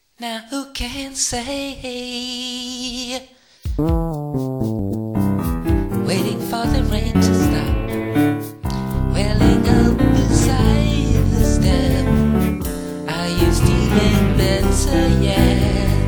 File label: Rain song